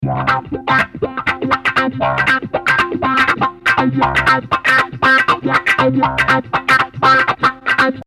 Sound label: guitar